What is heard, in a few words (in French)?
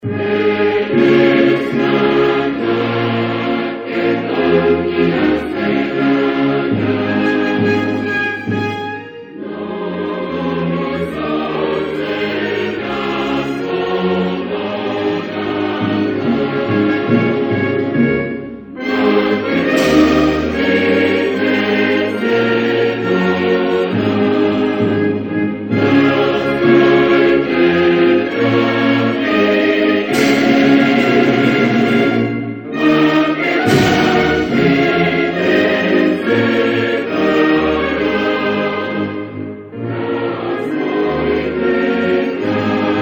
Macedoine, Hymnes nationaux